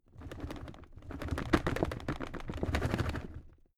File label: drawer cabinet carton rattle